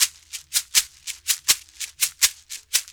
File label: Berimbau Shaker; World Percussion